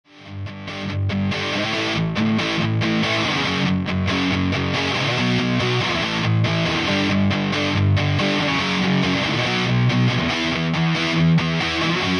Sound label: EG Distortion Solo